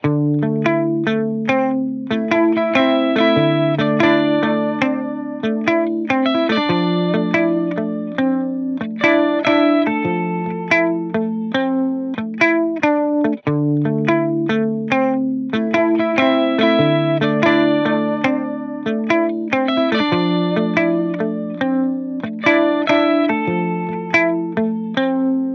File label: guitar loop 143 Dm; Pop; Guitar Stacks; SD PASADENA Pop Sample Pack; Slate